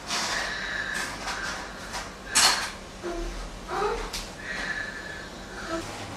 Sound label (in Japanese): 女の喘ぎ声